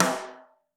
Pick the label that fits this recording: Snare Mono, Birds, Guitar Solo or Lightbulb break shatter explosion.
Snare Mono